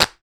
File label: Clap, Dubstep One-Shot Drums; Prime Loops; Dubstep Illusions